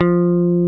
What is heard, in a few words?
F3 PICK BASS